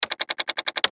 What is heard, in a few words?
Button Switch Gear Multimedia